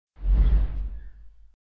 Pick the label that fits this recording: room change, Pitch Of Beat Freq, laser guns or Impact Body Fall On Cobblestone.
room change